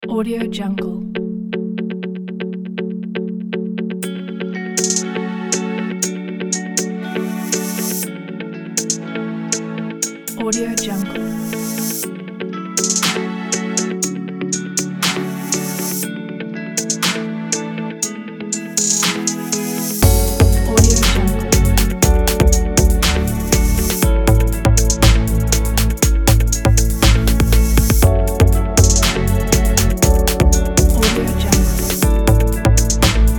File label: modern ambient kit